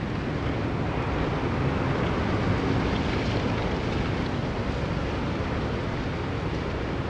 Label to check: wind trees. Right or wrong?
Right